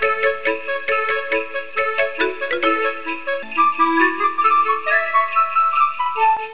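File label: box music; My Science Fair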